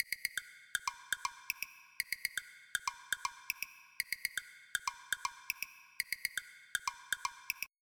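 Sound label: woodblock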